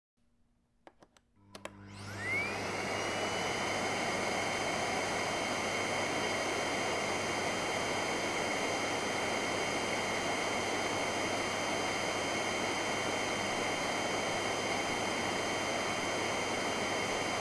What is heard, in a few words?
miele noise, pylesosa